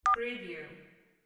cell phone

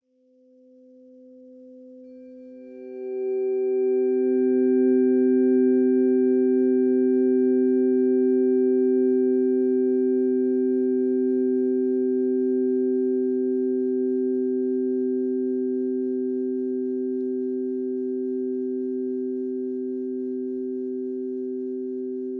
Meditation; Fibonacci Spiral

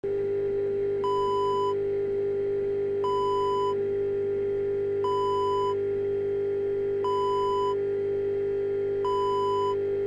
transformer

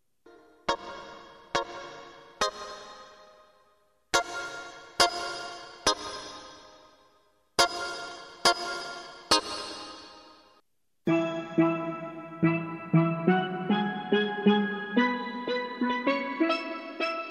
roots

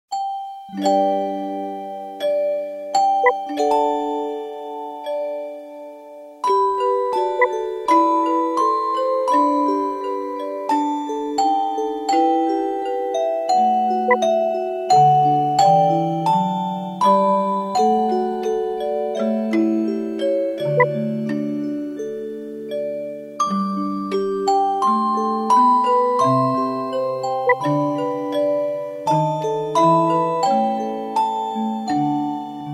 O Come All Ye Faithful Bells

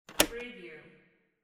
tape deck button

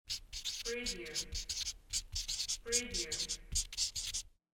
marker writing

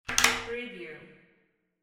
glass door open

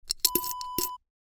piggy bank coin drop

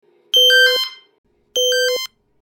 Alarm Shop Door

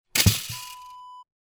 leaf rake drop

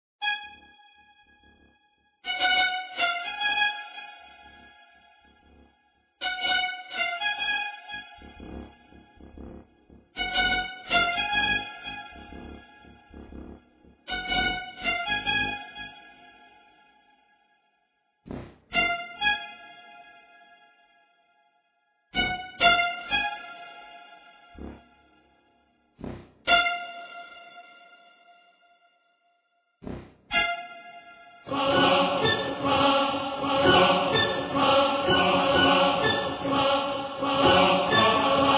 Dark Choir and Strings

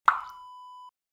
water droplet

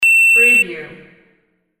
beep

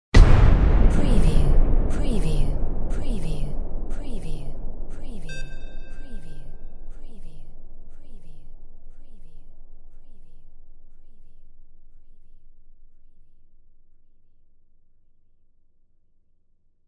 WAR CANNON; windy beach audio

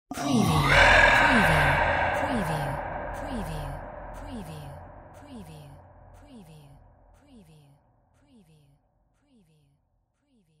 sci fi whispers spooky oorah; windy beach audio

